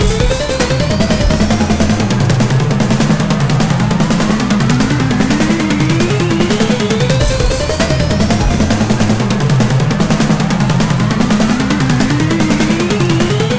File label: Boss Rising Loop